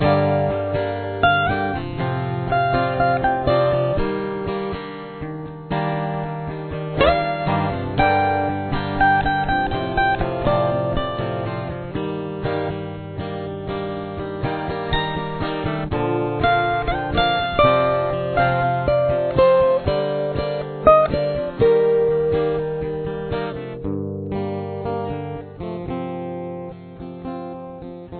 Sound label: outro